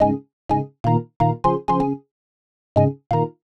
Organ chops (125BPM), retro house

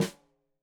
Snare Stereo, Drums; Deeply Sampled Drums; SMDrum

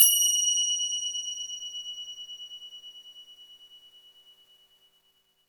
FINGER CY R, Synclavier Percussion; ILIO; Synclavier Percussion 2; World & Orchestral

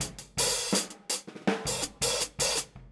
Boogaloo Fill Hi-Hat, 82 BPM, Drums; Sonic Foundry (Sony Creative Software) - Drums from the Big Room The Mixes

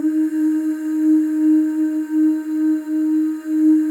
OO, FEM OOS, Voices

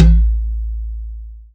techno, percussion techno

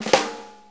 4-4 Snare Flam, Drum Loops; Drum Tools; Flams

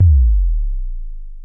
low bass long, Drums, Roland SH-09

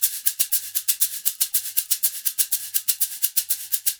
120 SHAK, Percussion Loops

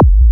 SYNTH BD, DANCE KIT

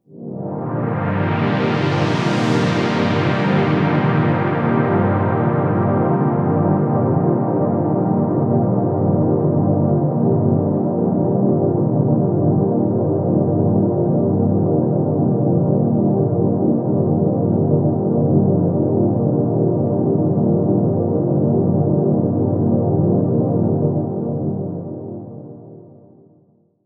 Pad C2, club techno

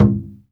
HAIRY DRUM E4; Spectrasonics - Heart of Asia CD2

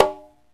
CONGA SET; OMI - Universe of Sounds

